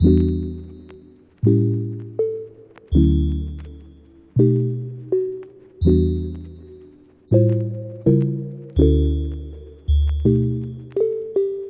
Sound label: Lofi Melody Loop - 82 BPM D Maj; Cymatics; Oracle Sample Pack